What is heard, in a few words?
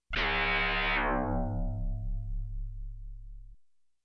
Soft metallic sci-fi synth